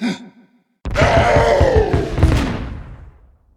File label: boss efreeti death